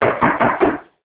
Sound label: door click